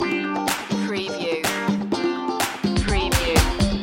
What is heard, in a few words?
disco loop